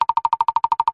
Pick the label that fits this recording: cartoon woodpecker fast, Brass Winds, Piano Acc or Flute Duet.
cartoon woodpecker fast